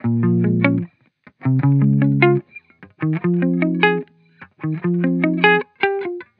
Hip Hop Guitar Loop - 150 BPM F Min; Cymatics; Vibrations Guitar Sample Pack

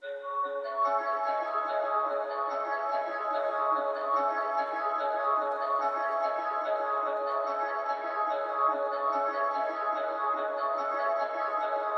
145 BPM C# Min Bell Accents; Melody; Trap; Loop Stems; Cymatics; 2022 Melody Collection; Ferrari

